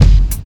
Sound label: modern rap kickdrum f sharp key